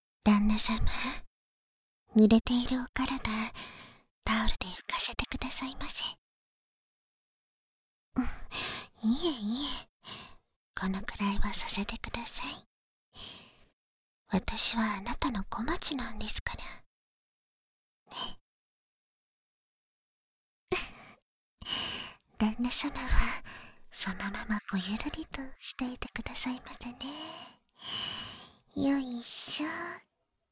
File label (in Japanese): タオル拭き, ASMR; あがってタオル拭き→お口で; 簡易モノラル編集 効果音なし